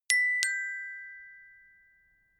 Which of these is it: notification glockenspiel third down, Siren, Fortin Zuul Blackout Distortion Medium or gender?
notification glockenspiel third down